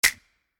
Clap

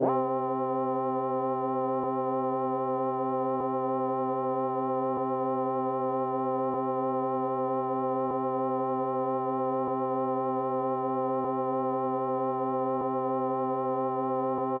Organ

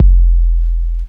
Kick

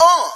Vox

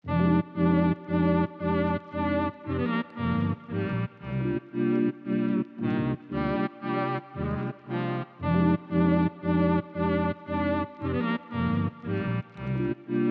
jazz 115bpm

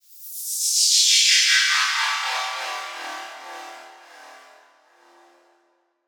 SFX

